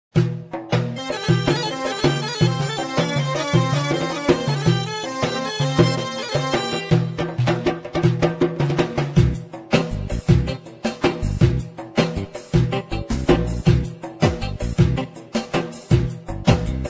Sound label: saadawi, arabic; pa80; korg